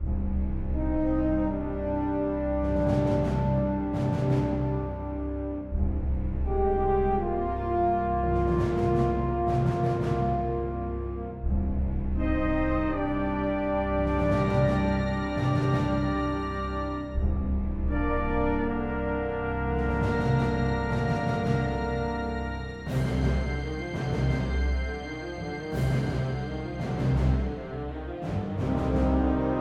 Battlefield Pause; The Dwarves 2016 ps4 gamerip

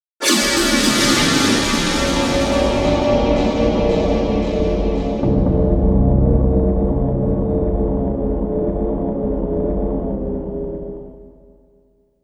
Shock

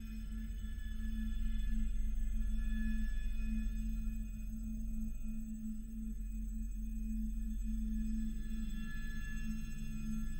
Cave Start Atmo

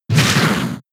Low Sweep; pokemon sfx gen 6 attack moves xy oras

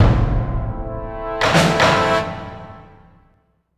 music stings stinger; LEGO Movie Videogame; PlayStation 3; pirateship

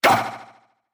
whipper pain

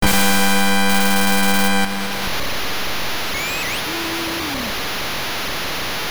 Success Jingle; pop n music gb disney tunes

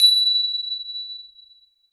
SFX Shot Best Timing; pangya fantasy golf pc

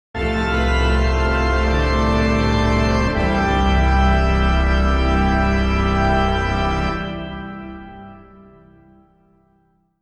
Melody of Celebration; super mario rpg switch 2023

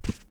Walk; Dumbledore

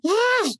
inmate pain

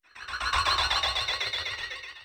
robot death; enemydeath

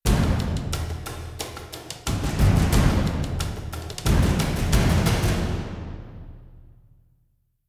Combat In; Skellige

